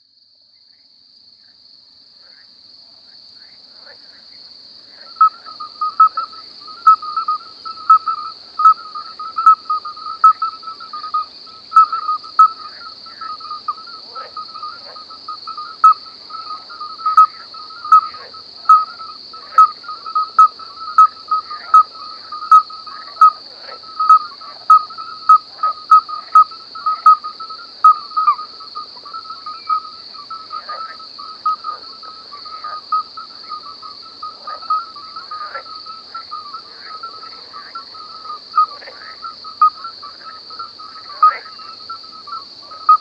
Nature Sounds - Nocturnal Wasteland Sounds 1 Crickets, Grasshoppers and Owls; Nature Chill Out 2014